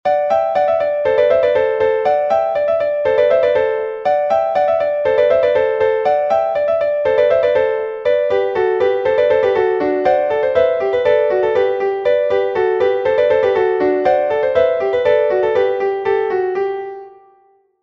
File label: Polka